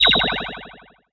Sparkle Pop; knights try

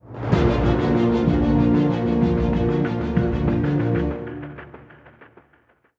190bpm; Legends of Pegasus; windows gamerip 2012

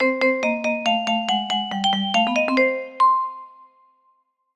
Jingles; Muffy

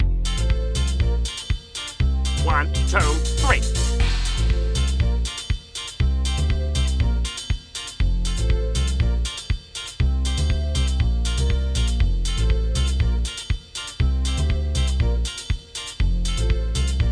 Beats Me (Fast); SpongeBob SquarePants Lights, Camera, Pants; playstation 2; gamecube; xbox

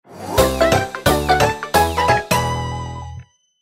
All Items Jingle

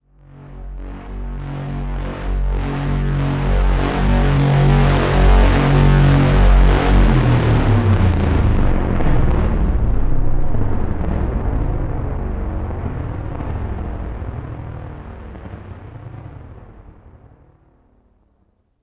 Music Tension One Shot; Battlefield 3

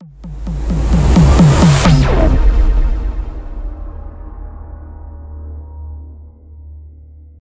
Transition; Everspace 2

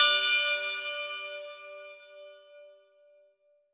orbit tubebell; Sky Children of the Light gamerip

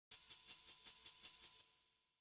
Town Intro Shaker; backpack hero gamerip 2022

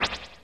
charge step; gamerip; stardunk

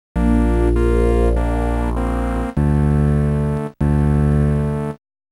Appearance Jingle; Metroid Prime and Fusion

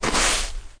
Footsteps

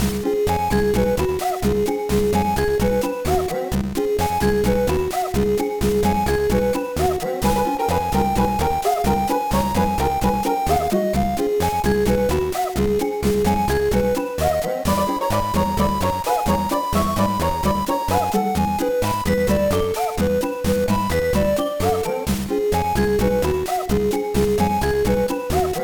BGM; Kappa no Kai Kata Kaatan Daibouken; GBA

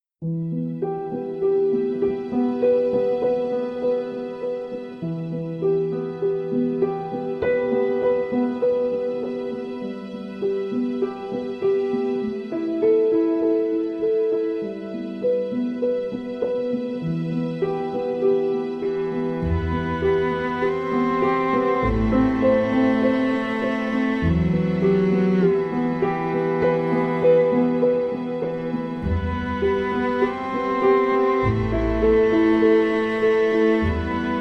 Emotional Cello And Piano Background Music; For Videos Films; Avocuddle

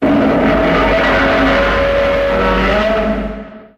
Now Loading; brawl stars; Godzilla

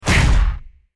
effective sound; Caravan Beast

